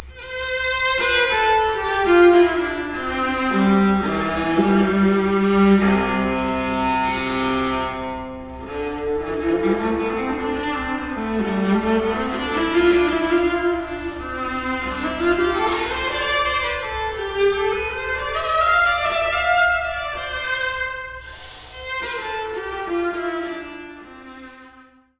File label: viola solo; Bach Preludium 3th Suite